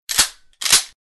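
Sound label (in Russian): перезаряд дробовика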